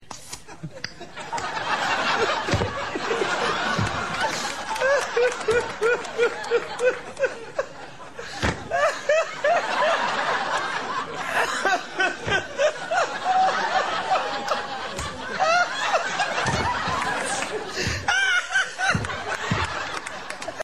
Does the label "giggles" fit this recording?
Yes